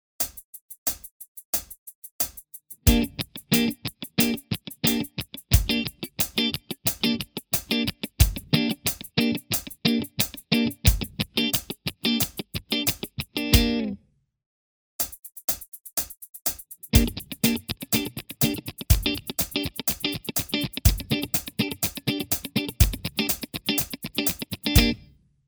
cocottes base

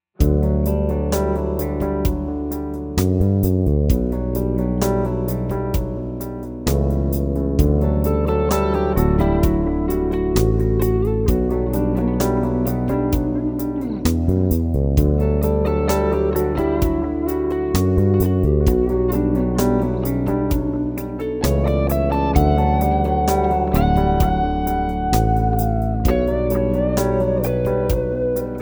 ex impro ionien

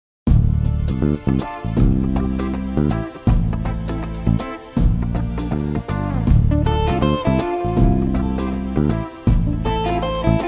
ex impro aeolien

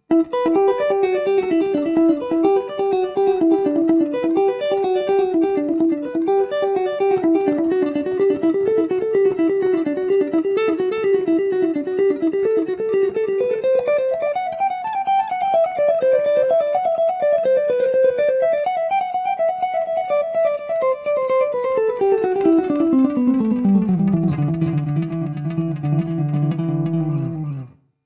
exo delay

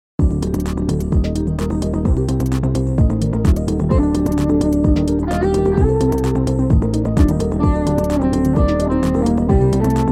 impro phrygien

